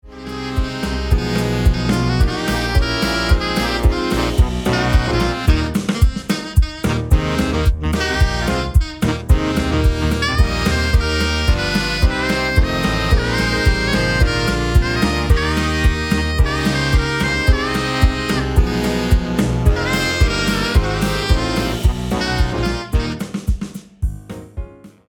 Sax Ens; sax ensemble and rhythm section

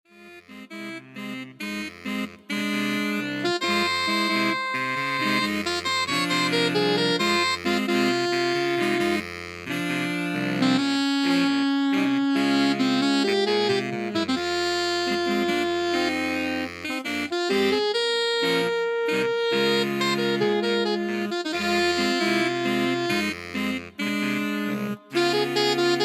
Otra Danza Sax Quartet

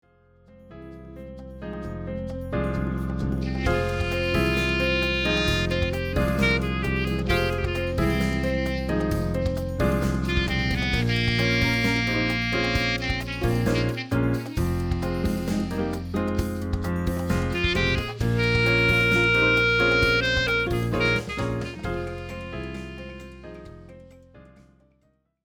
Otra Danza Rhythm Clt

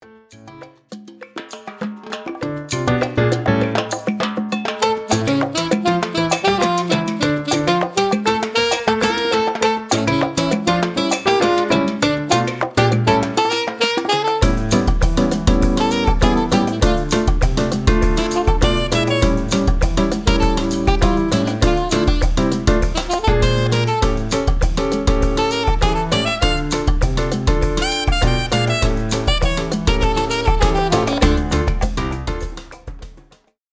alto saxophone and piano

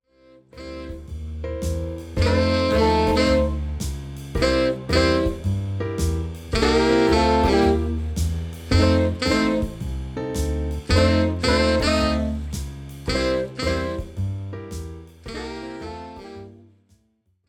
Ensemble Blues; groovy kick starts ensemble teacher pack

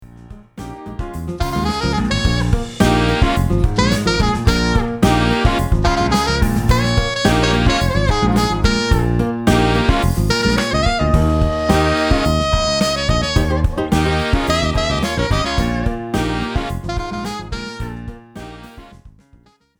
Sax Ens

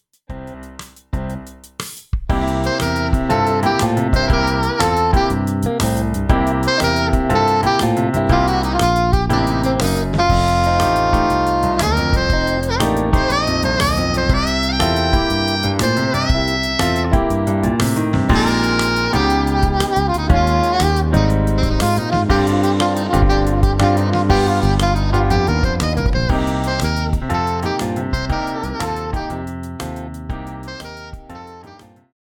Yin Yang Blues Alto; for alto sax and piano

